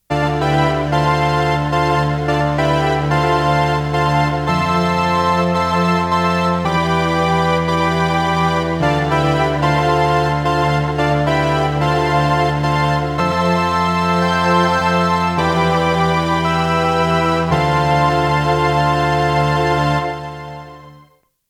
T REX Replicator Module Sielorchestra Stereo Chorus